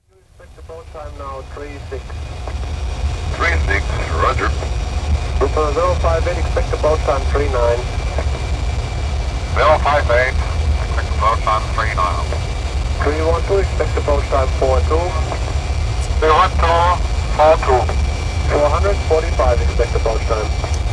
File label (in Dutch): propvliegt